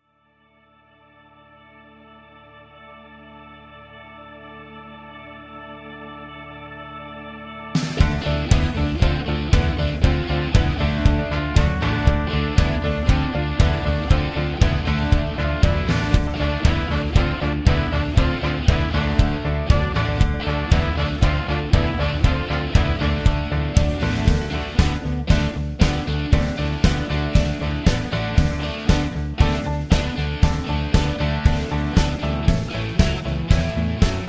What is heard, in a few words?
(D) No Backing Vocals